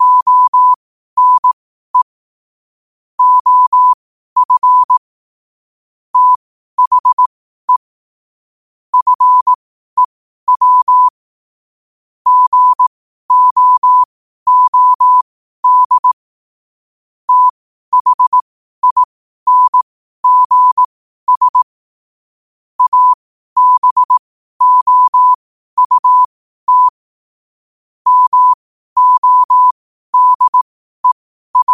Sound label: 5 wpm, morse; qotd